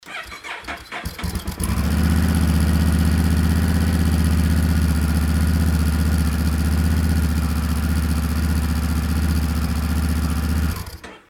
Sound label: rebel1100 moriwaki silent niwa; sc83